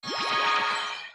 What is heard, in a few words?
sparkle; raven; Teen Titans Go! - Raven's Rainbow Dreams